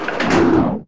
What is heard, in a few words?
Blade; Sotha; The Elder Scrolls III - Morrowind; Morrowind GOTY GOG